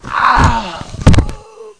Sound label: death; Alien Arena; hunter